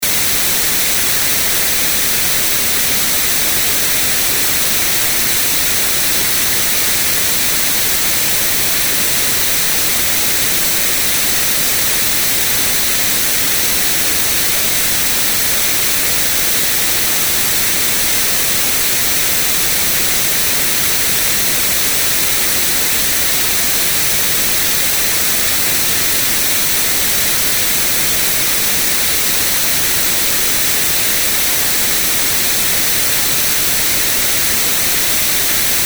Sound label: white noise stereo